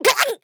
Otter hit

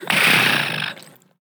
Otter sleep loop out

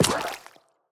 Background Otter footstep

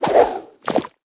Background Otter sleep

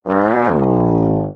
Yawn Voice; Wolfgang

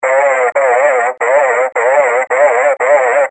Hurt Voice

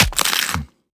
Background Otter eat

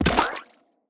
Otter footstep

